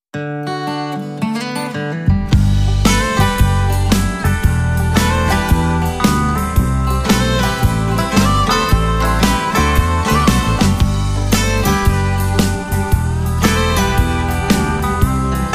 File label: country band